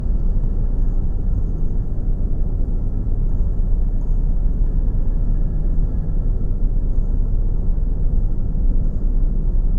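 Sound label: machine room drone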